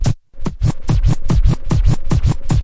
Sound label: turntable scratch; Scratching and Turntablism; DRUM LOOPS and BREAKS